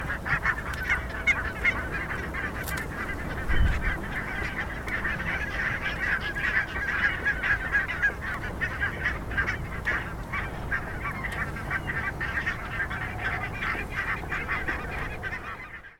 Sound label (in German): weisswangengans